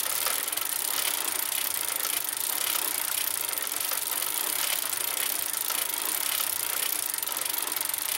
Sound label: metal rattle; tanks; War Thunder